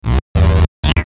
Stutter Short Electric Buzz

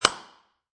Hammer Nail

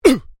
MALE VOCALIZATION UGH; Exclamations; Voice

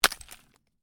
Ice: small chunk of ice lands on hard surface; Snow; Stuart Duffield

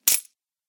Coins Impact; Money Sound Effects

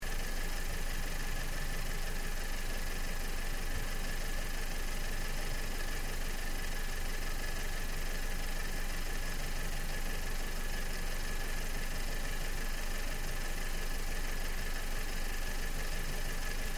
Idle Engine Bay Loop, car; Hyundai Accent 2008; Hyundai hire car; Vehicles

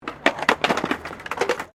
SKATEBOARD FLAT COME OFF; Skateboard sounds; Skateboarding (Sound Effects:Sports)

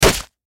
Impact Splat Juicy Hit Drop; Splats (Sound Effects:Objects); Blastwave

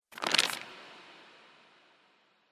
Monastry Manuscript Paper

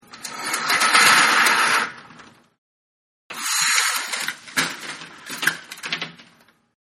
Blinders Being Open, Blinders Shaking, Rattling; Blinder Open 02; Window Sound Effects; Household

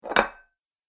Medium Side Plate Placed On Dinner Table; Dishware Sound Effects; Household

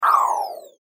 Blurp Airy High; Blastwave

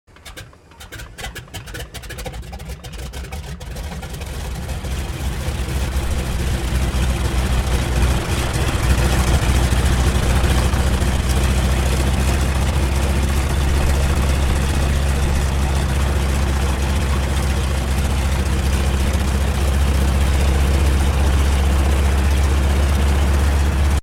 AIRCRAFT BOEING PT-17 STEARMAN STARTUP, Trainer; 1939; Airplanes; Vehicles

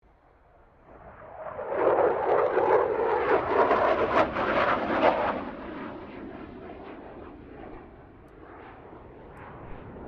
AIRCRAFT F16 FALCON MANEAUVERING; Airplanes (Sound Effects:Vehicles)

